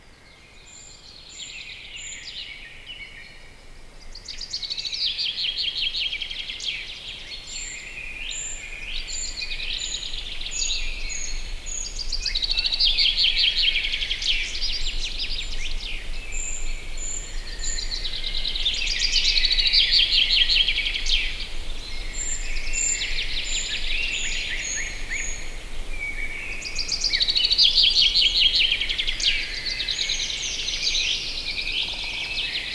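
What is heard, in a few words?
spring coniferous forest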